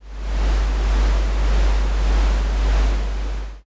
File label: heavy machine